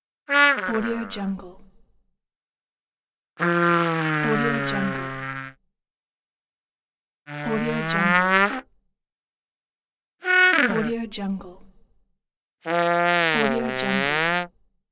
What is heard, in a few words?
cartoon bloopers